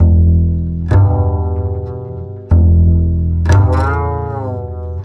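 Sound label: 095 Acid Jazz Doublebass Eb major; Pro Tools Essential Loops; Digidesign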